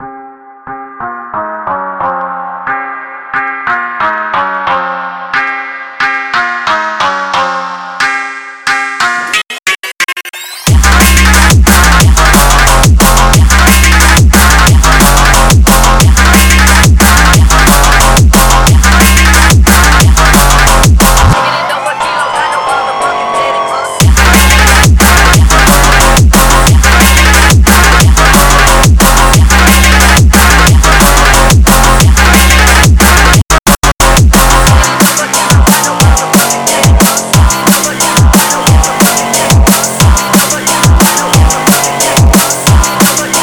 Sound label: Phonk